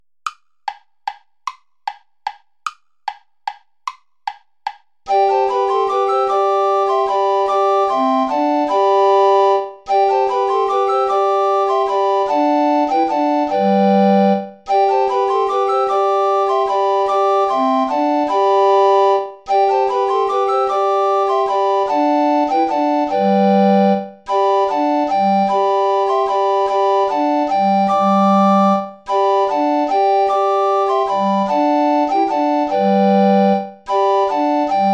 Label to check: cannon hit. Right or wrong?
Wrong